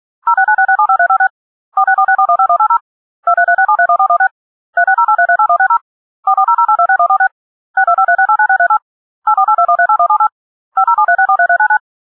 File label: dtmf dialing